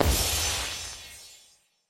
big Win Text Change; jewel fruits

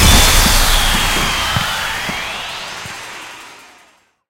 big win start; disco danny

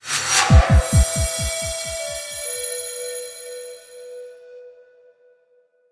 intro free spin; eldorado

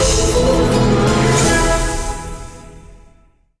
large Win; pyramid

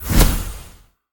progress change high; silverback

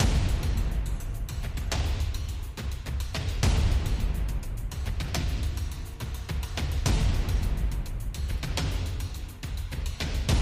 percussion Overlay; steamtower

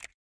button Hover; hotline

